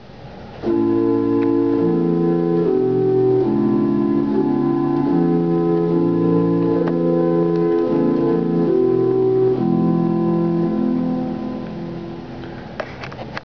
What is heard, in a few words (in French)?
bourdon, orgue